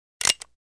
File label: gun